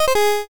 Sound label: gacha lose